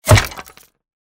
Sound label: shot miss; Fluffy Arcade: Doubleup Ducks LuckyTap; Eyecon